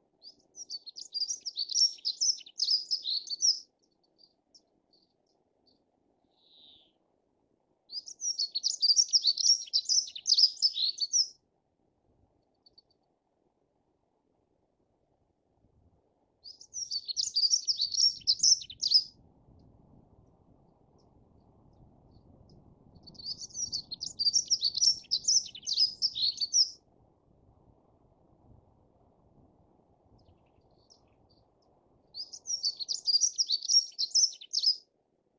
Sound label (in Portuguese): canto da ferreirinha comum